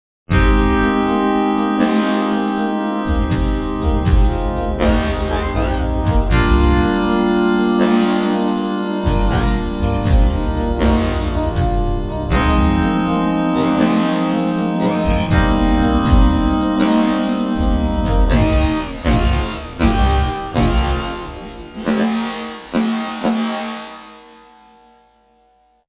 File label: Pop